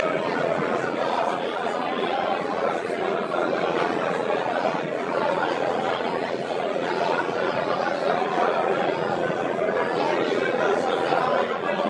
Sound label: crowd